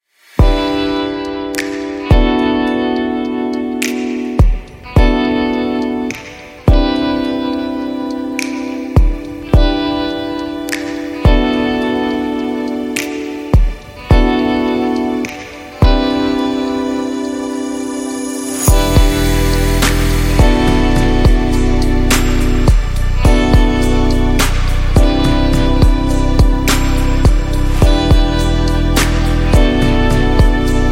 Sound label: Abstract Chillout Dream, Ambience Electronic Music